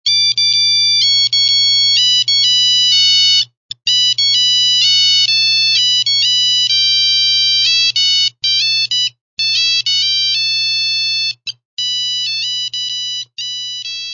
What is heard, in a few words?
jingle rock